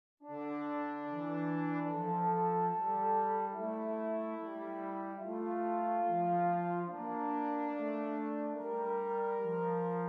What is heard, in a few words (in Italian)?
Esercizi di contrappunto a due voci; zweite Gattung; Ronge pagina 58